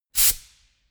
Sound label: pneumatic pump burst